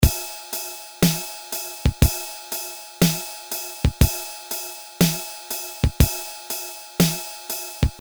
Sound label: Slow Rock, Boss DR-220A; Preset Patterns